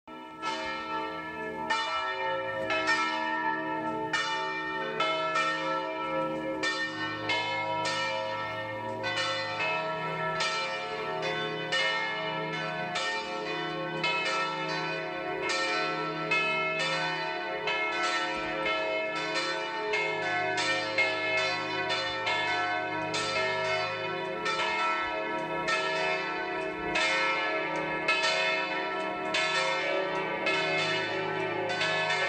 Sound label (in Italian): volano campane ore 10 pasqua, Suoni Ambientali; pasqua 12 aprile 2020; Portobeseno